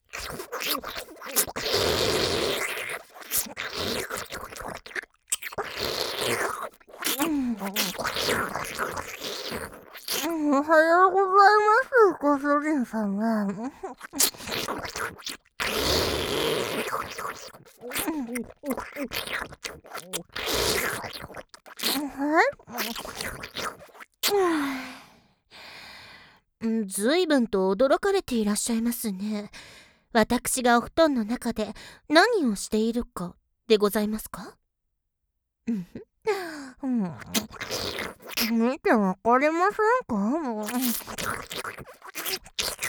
目覚まし フェラチオ 搾精編

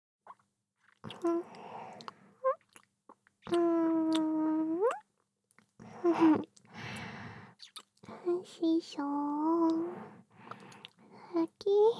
キスオンリートラック; ロリフーカ

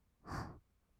ブレス 吐く音, "は"; ASMR; 1200 kawaii voice!!

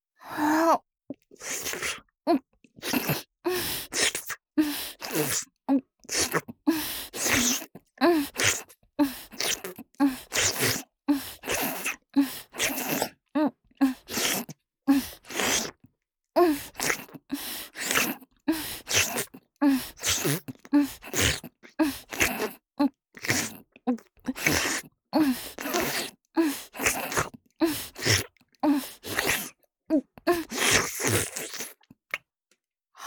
頭を上下に振って陰茎を深くしゃぶる; 実用ボイス抜粋集

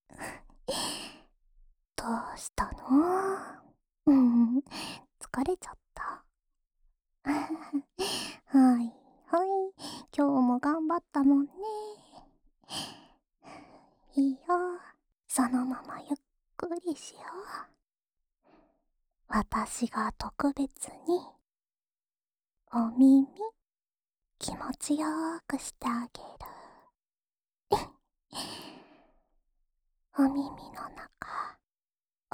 安眠用梵天耳かき（左耳）, ASMR

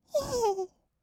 "いひー", kawaii voice; 1200 kawaii voice!!

